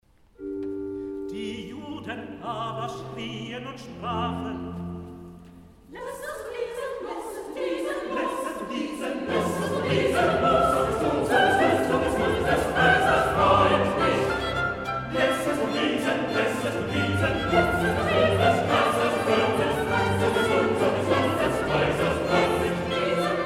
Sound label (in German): Recitativo Chor; Laessest du diesen los